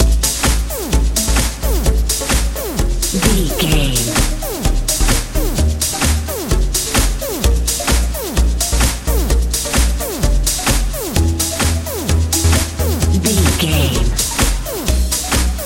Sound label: colorful good echo head life euro dance